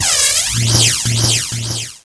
shield; BaboViolent 2

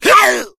smoker pain short, voice; Left 4 Dead 2

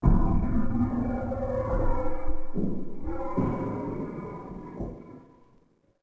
Pound Growl; Ambient; Sid Meier's Civilization 4 Complete; Beyond the Sword

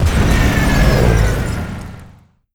airplane burn; Hearts of Iron IV Death or Dishonor

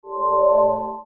friend login; League of Legends; lol air client

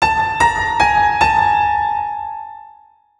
spitter bacteria, music; Left 4 Dead 2

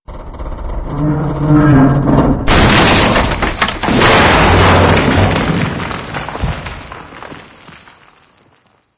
timed debris fall

